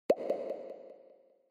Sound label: Pop Low Wet